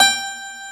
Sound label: PIANO G4